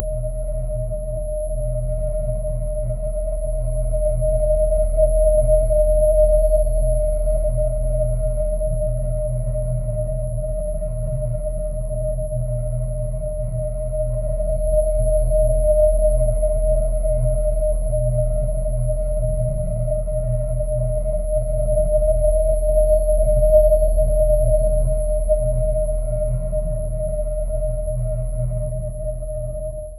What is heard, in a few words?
a suspenseful cinematic s, building intensity